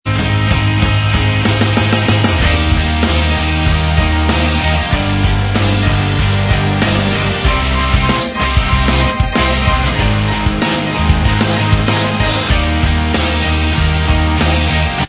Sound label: Inst Track